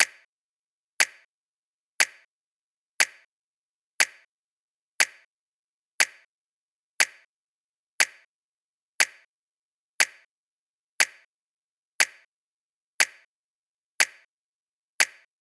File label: click cue, mono; cstrike; communityblock 2020